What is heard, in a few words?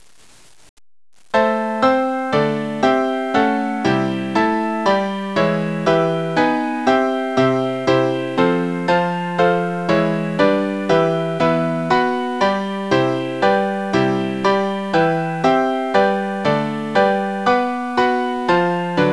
fractal Chord Generator